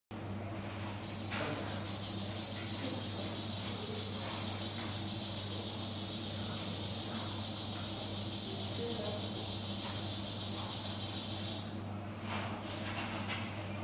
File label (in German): erdbeerfroeschchen rufe